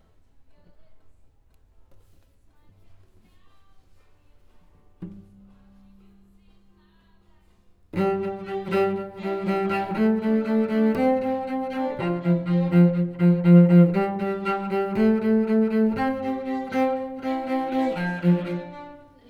Cello

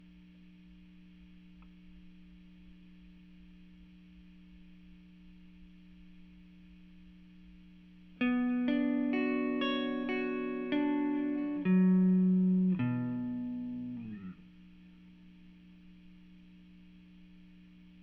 GTR

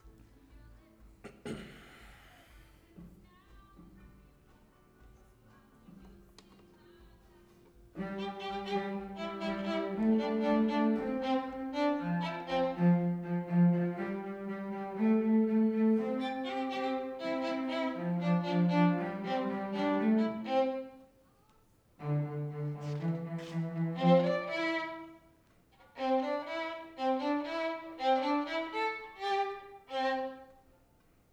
Violin

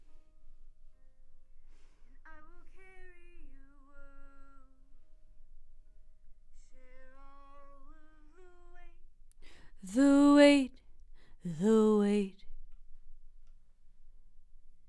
OMG VOX

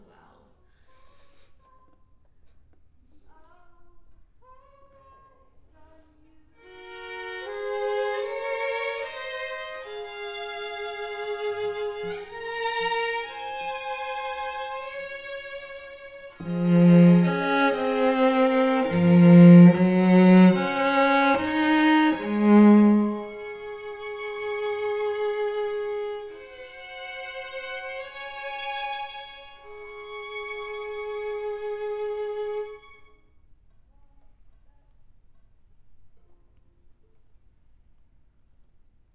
Cello

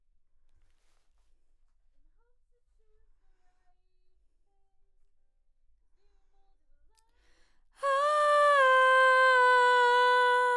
Vox new

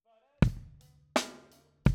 Kick In

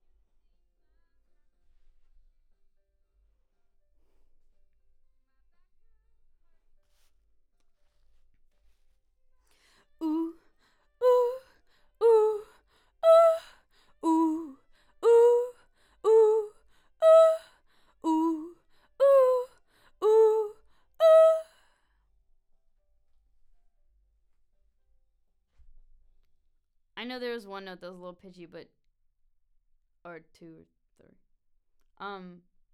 BG Vox

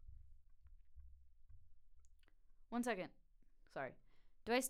Vox

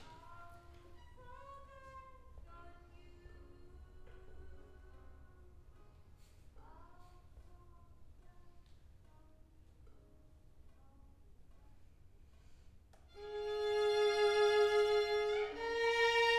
Cello

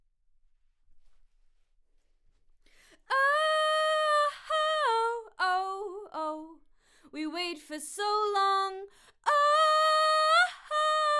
Vox start new

